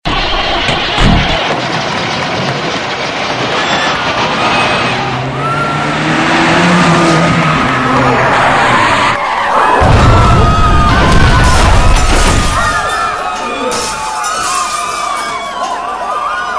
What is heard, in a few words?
robin crash